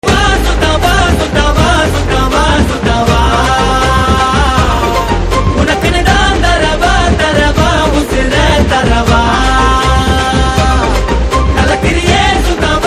Ringtone

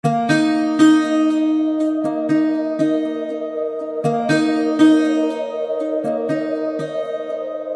piano ringtone

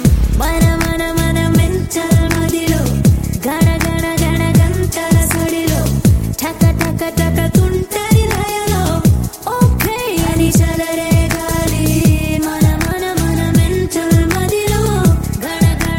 Female

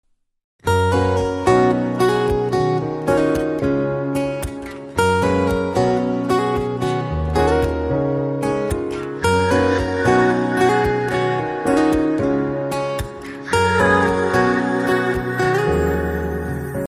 BGM; Akshay Kumar; BellBottom

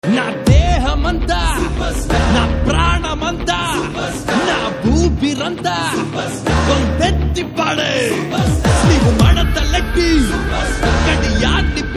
Anthem Ringtone

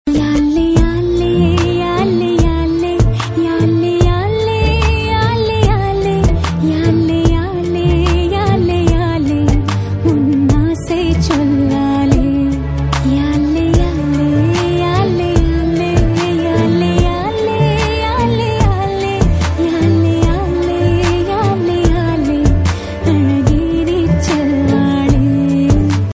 Ringtone

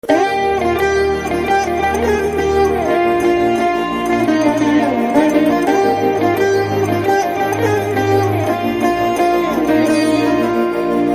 BG Veena tone

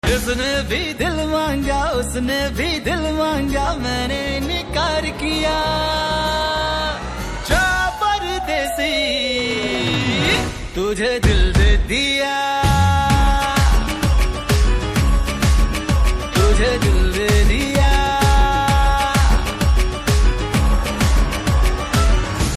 Male

